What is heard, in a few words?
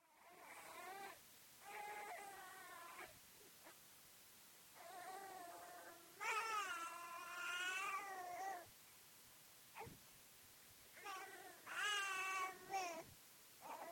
crying